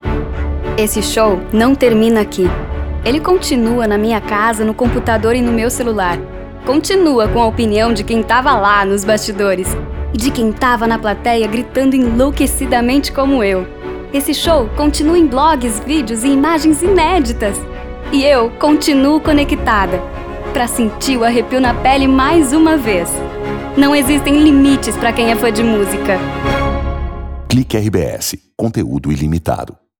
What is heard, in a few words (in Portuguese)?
Musica; Clic Rbs S30